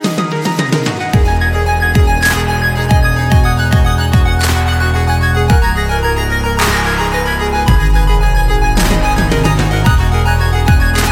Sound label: ELECTRO; Son et musique; Kerity